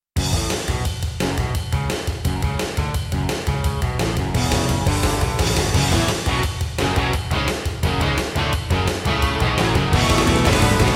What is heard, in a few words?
Intro, Roland SC-88ST Pro 88MAP; Duke Nukem 3D